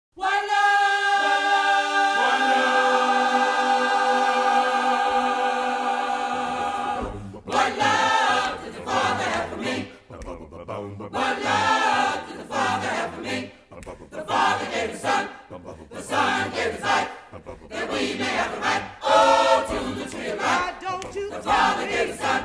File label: choir